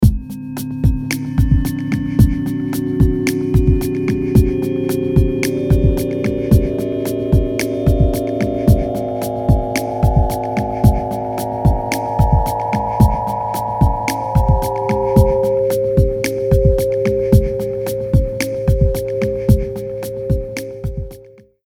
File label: The Unity Tone Stereo